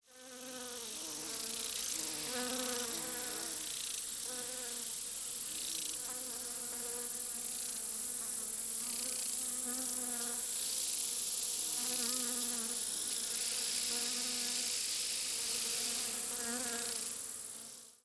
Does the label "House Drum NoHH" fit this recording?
No